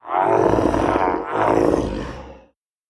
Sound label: Stagger; The Town